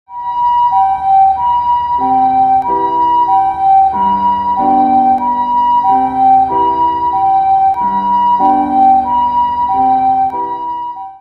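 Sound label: kyukyusha piano, ambulance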